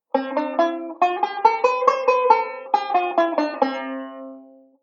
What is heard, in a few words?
Delay Scale; Beyond Chord Melody Volume II